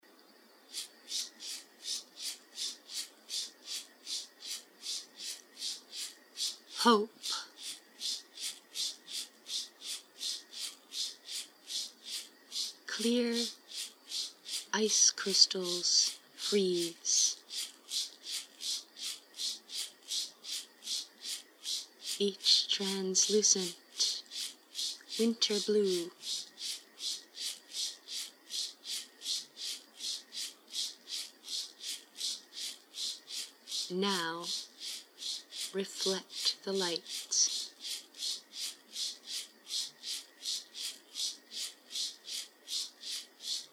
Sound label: flute haiku; hope